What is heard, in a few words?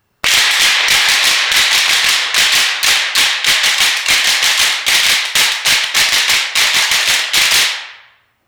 Stix Slapping